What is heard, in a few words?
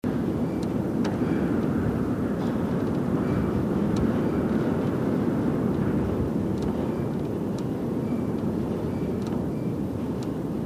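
WIND Interior Small room Gusts Droan loop; gamerip; The Unfinished Swan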